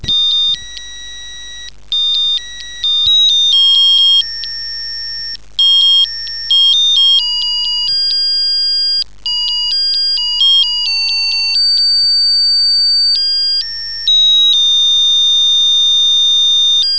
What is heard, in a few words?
love story, melody